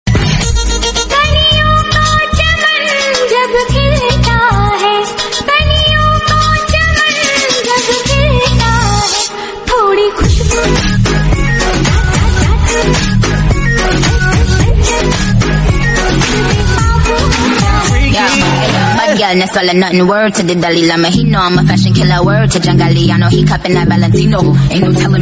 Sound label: mashup